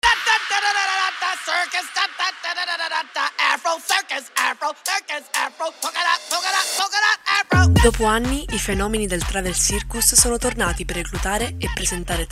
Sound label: Jingle; Travel Circus; IT